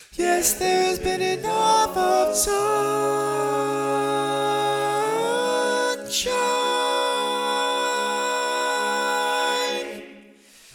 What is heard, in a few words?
Tenor, barbershop tags